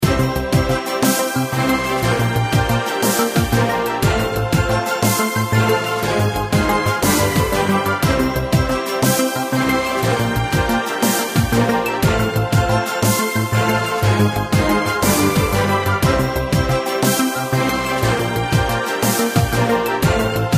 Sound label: 3 Star Win; rush wars